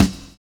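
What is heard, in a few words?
BIG hi-hat OPEN; Univers Sons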